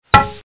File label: hit pipe